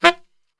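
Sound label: F saxophone shot, Brass Super Section; Best Service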